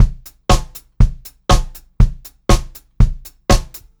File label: 120 pop beat, Pop-Rock Drumloops